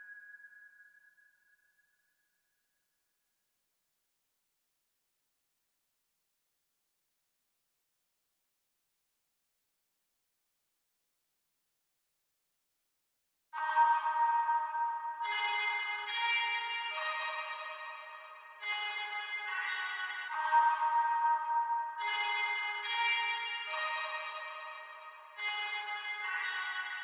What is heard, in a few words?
plucks, 142 BPM D# Min; Cymatics; Can't Die